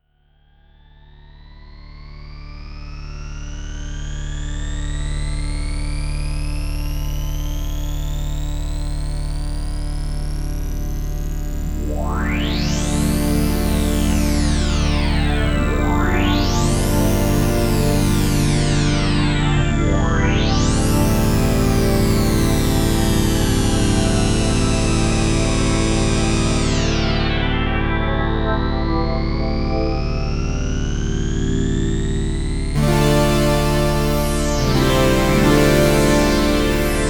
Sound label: JupiterX Juno106 Filter Sweeps